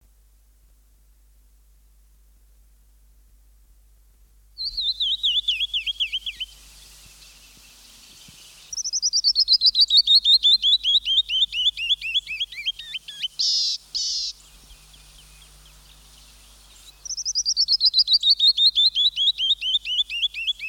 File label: canyon wren, songbirds